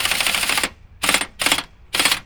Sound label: Air wrench